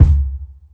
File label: tight lows bass drum single hit c sharp key; Duration 0:01; Samplerate 44100 Hz; Channels Mono